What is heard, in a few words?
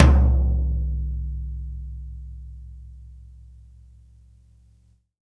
LUD16 BRUSH; Big Fish Audio; Ross Garfield Drums VOL-2